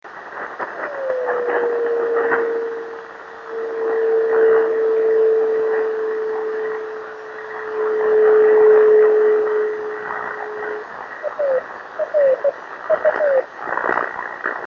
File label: 26840KHz